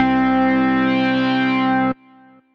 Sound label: Alpha Lead, Synth Lead; Ableton; Factory Packs; Chop and Swing